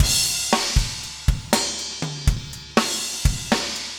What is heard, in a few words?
funk 120 bpm, loops